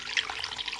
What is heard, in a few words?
h20 running